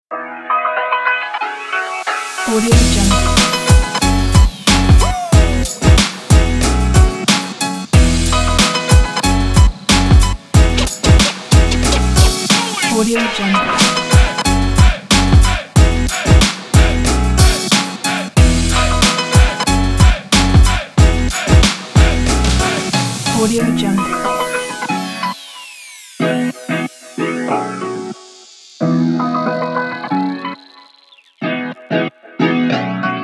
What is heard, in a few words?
hip hop